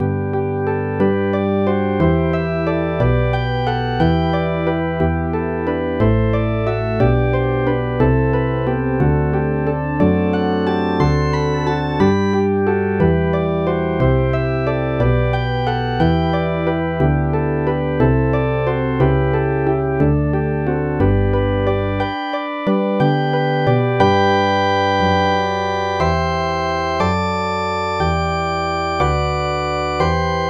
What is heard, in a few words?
synth bass